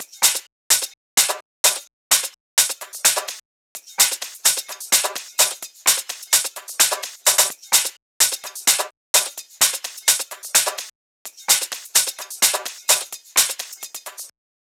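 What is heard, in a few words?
Drum Loop 128BPM hi-hat Loop; VEC5 Drumloops; VEC5 Multi Loops 128BPM; Loop Elements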